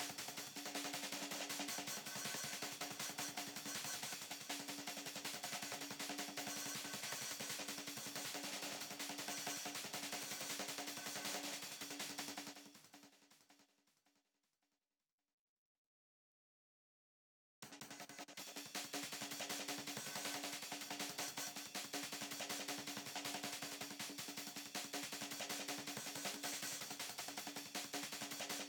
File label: beat delay